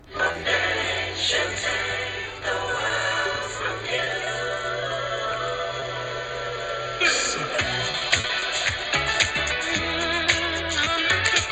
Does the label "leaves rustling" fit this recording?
No